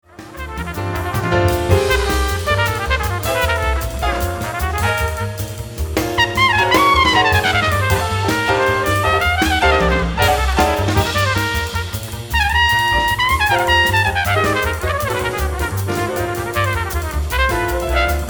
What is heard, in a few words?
trumpet